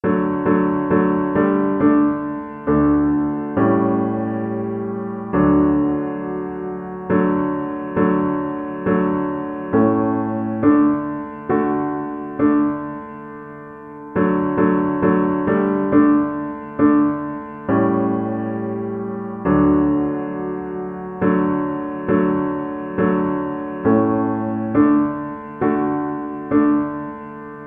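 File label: viola karaoke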